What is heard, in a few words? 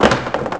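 boat creak; envrn; The Elder Scrolls III Morrowind GOTY; XBOX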